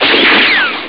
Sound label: shot; ST-XX Amiga Soundtracker Sample Packs